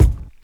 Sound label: good kick single shot b key, Royality free kick drum sound tuned to the B note